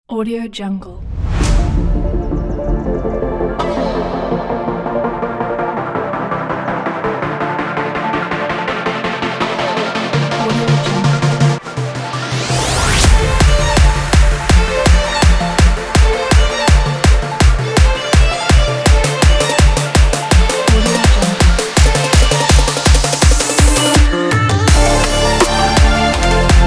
emotional inspiring motivational future bass; art-media

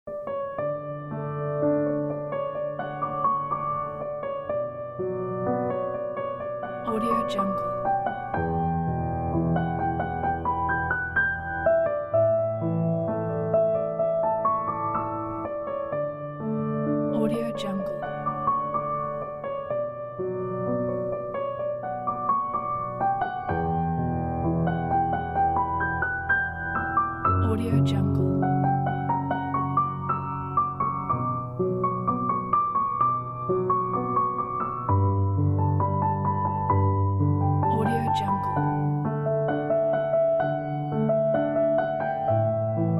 peaceful

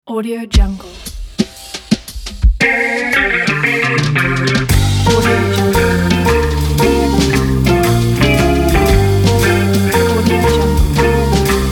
jazz blues intro logo

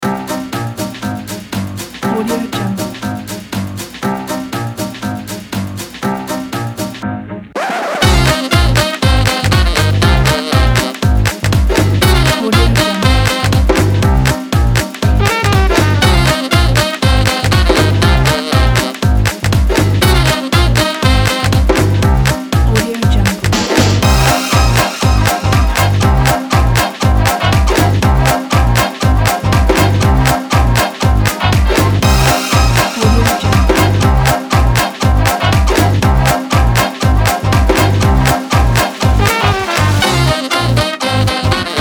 swinging

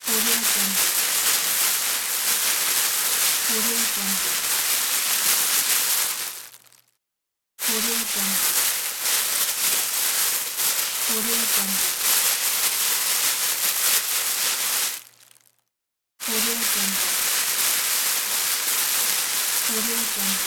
rustling packet; fx-library

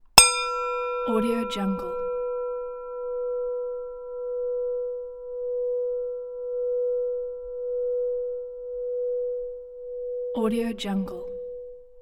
thin singing bowl

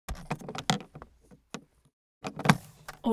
object case aluminium open close

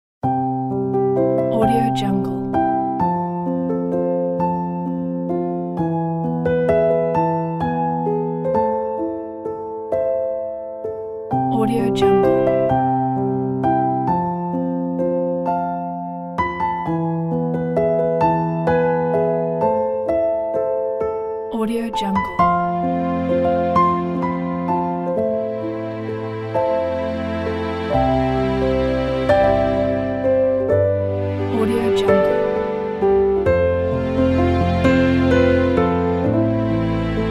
handtied bouquet romantic piano strings

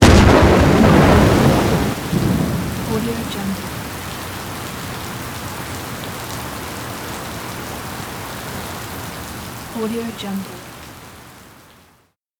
a thunder with rain

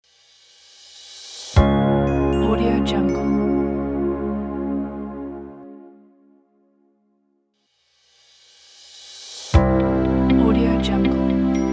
corporate logo pack

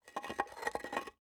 coral on granite; prosoundfx

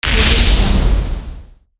flame piston woosh; soundstormlabs

